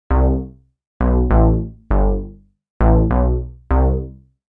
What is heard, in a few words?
Plasma Bass chorus; Plasma-Bass2